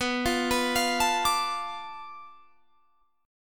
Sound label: F 6th Flat 5th B, chord